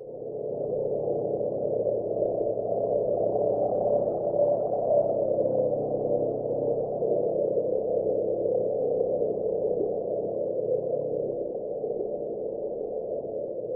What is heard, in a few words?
glow atmospheric background